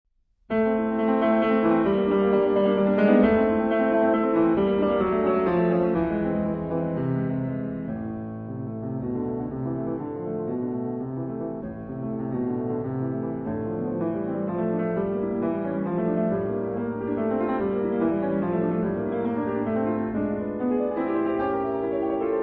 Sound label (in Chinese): 管風琴; HORTUS